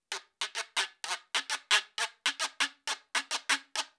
Guiro avec ornementation ternaire

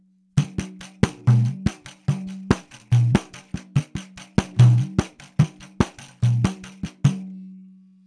Bossa-nova et surdo, pandeiro

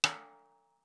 Caisse claire rim

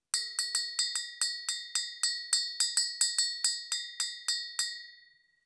Cloche mandingue; clochesmandingues

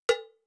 Cloche de bongo bord; clochebongo

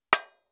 Tamborim fouet levee

